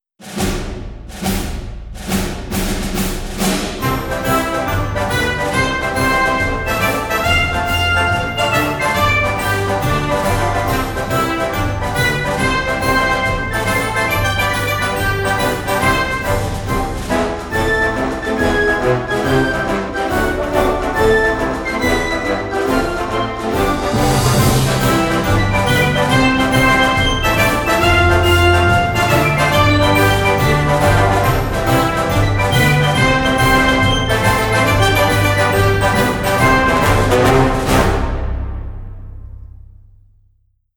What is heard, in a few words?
March; Scriptnotes